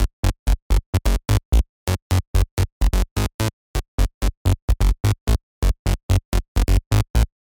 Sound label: BASS